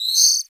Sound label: chirp, cartoony; Spectrasonic Distorted Reality 1